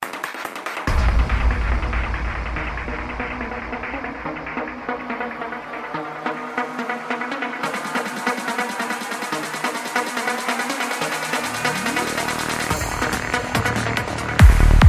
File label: PSY TRANCE SAMPLES